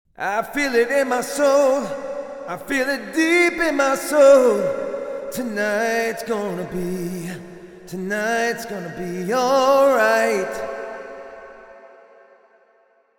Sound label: Reverb Vocals Painted Gym; CRTIV Reverb